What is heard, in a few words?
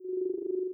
Pickup Health; FPS; Pickups; FirstMicroGame